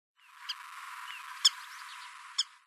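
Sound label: oriental pratincole, hyogo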